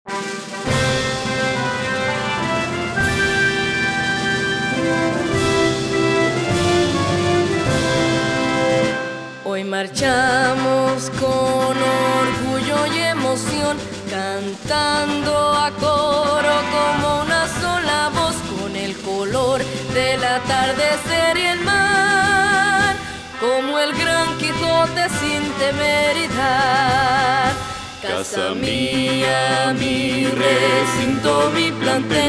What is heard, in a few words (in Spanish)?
Himno